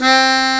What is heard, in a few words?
BLUESHARP C1, MUNDHARMO; Best Service; Alpine Volksmusik